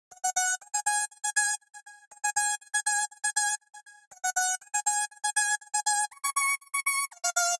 Lead, Dancefloor Pop; Remixlive

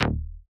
Bass Robot Hit, Dancefloor Pop; Remixlive

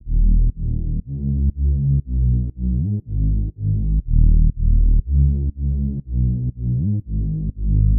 Bass, Dancefloor Pop; Remixlive

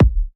Kick Hit; Remixlive; Dancefloor Pop

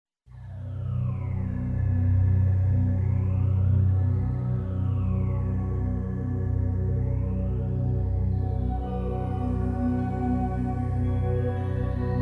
Bulgarian Melody

